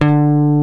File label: LES PAUL